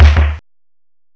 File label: SNARE, DRUMS